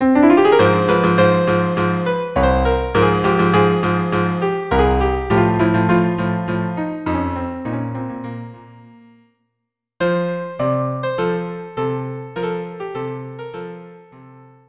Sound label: song viola cello; Toreador